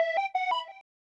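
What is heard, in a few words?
175 drum and bass Synth Ebm; Dance DJ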